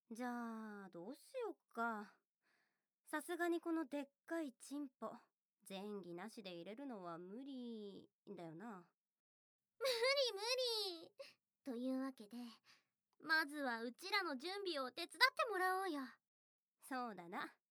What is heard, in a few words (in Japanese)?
両耳舐め, ASMR; 美沙と沙良の両耳舐め手コキ♪